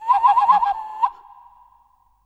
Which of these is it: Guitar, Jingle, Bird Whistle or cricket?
Bird Whistle